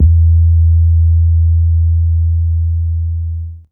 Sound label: Super Bass; Big Fish Audio; Old Skool House; OSH Bass